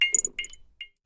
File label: Neon Light Flicker; lethal company; ambience; windows